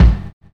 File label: kick, Drum and Bass